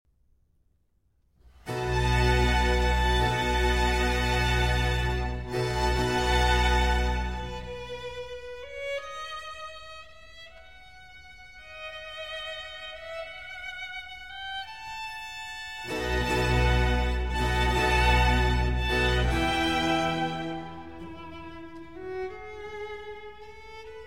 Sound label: Concerto No. 10 In B Minor For 4 Violi